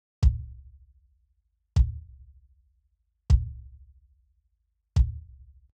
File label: BD dry